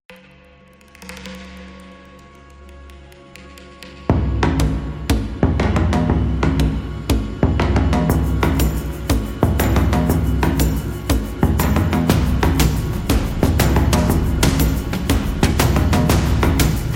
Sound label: Tribal Africa